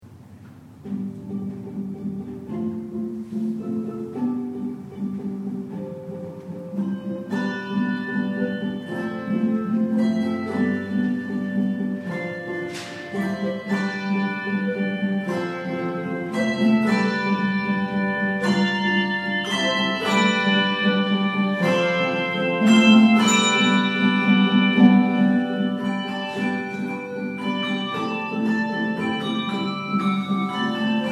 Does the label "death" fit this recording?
No